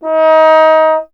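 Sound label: bass trombone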